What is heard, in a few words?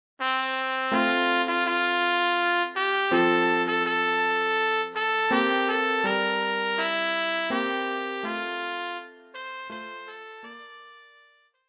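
trumpet, für Trompeteund Klavier; für Trompete und Klavier, Schwierigkeitsgrad Klavier Level 2, leicht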